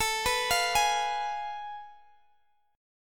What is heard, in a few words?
F Diminished A; Fdim/A arpeggiated; JGuitar